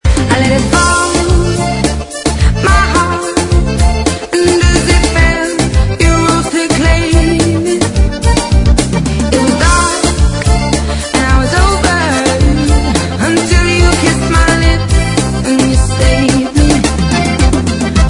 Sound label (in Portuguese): Forro Remix